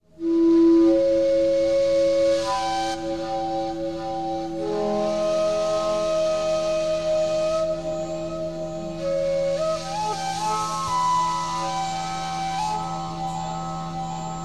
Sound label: Harmony Reel